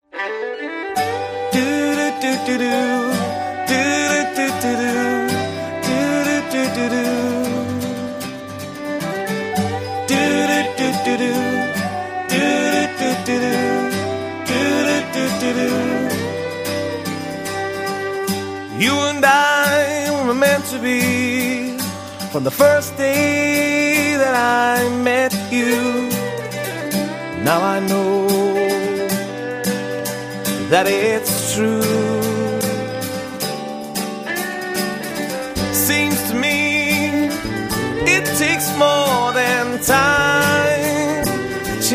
Irish Band

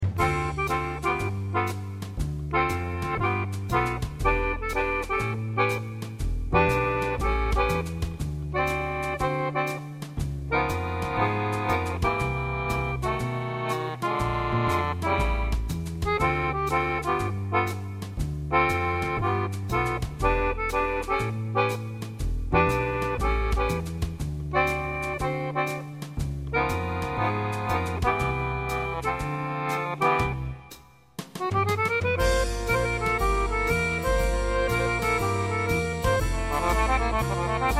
Accordionist; Orlando

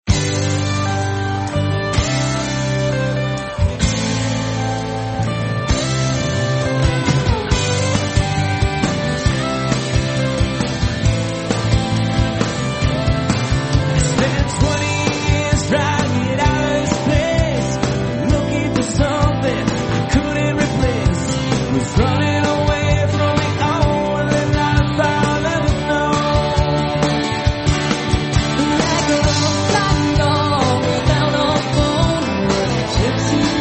Country Band

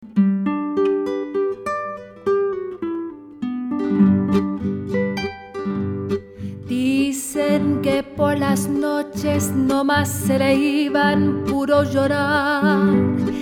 Latin Ensemble

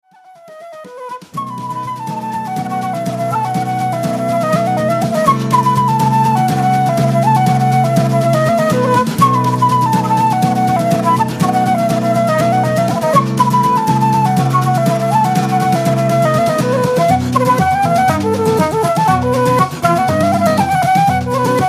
Irish Band

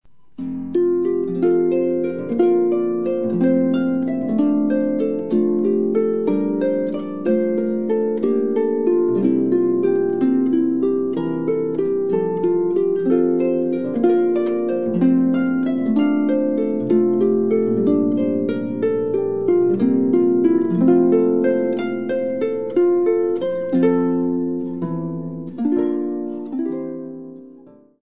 Harpist; Seattle; Leslie McMichael

